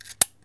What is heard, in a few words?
Camera